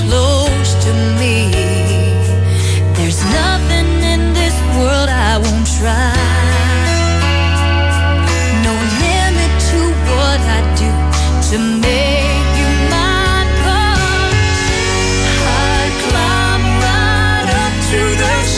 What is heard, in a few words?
country